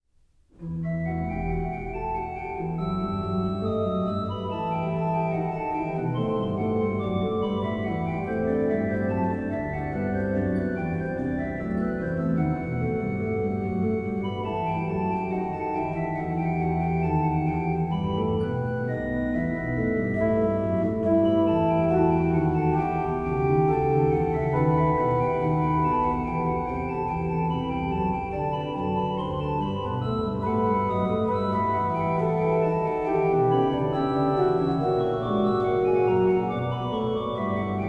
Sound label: Chorale, Great Organ; Wo soll ich fliehen hin, BWV 646 Schubler Chorale No. 2; Bach; Bach Great Organ Works; Johann Sebastian Bach